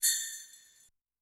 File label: Jing Bell; Yamaha DTXpress-II; Perc